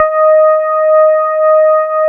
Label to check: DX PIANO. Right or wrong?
Right